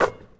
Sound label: Basketball Net